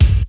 hq clicky hip hop bass drum one shot a sharp key

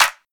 studio hand clap f sharp; single hits one shots

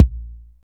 small reverb bass drum single hit a sharp key

